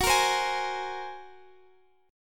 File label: A sharp 7th Suspended 2nd Sharp 5th